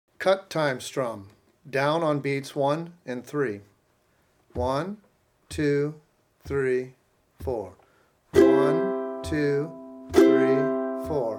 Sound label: Cut Time Strum